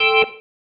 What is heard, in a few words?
Stab, Organ, One Shots